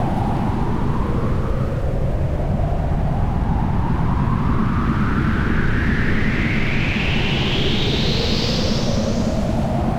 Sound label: Swirling Wind Loop